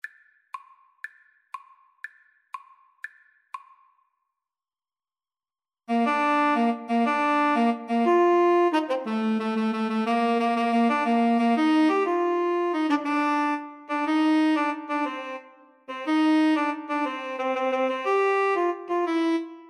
Tenor Sax Duet

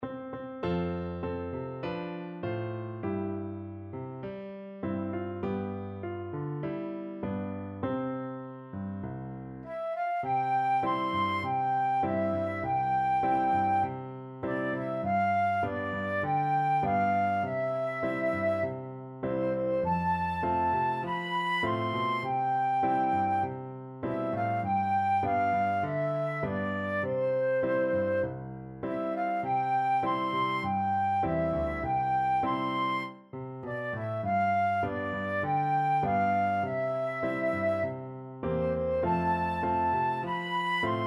balonku ada lima flute

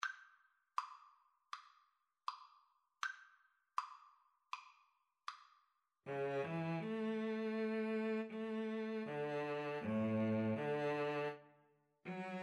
violin cello, Violin-Cello Duet; farewell song

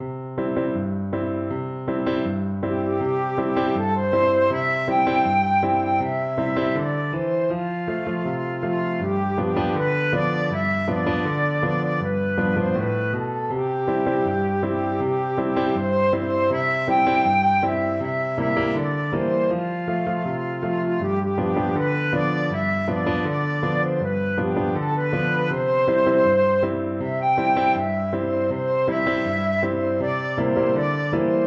wabash, flute

